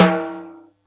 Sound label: Yamaha EX5, Drums